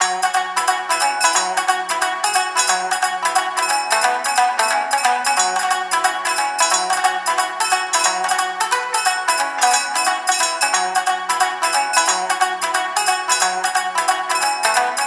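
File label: Awaodori Wet Loop; Yokai Watch 3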